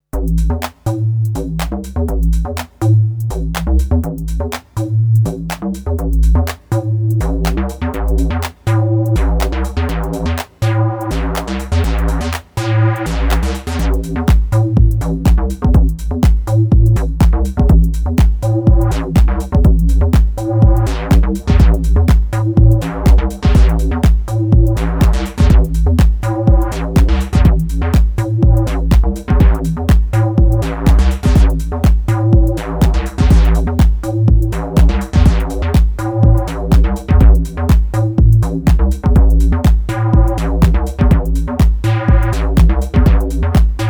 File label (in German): unisono bass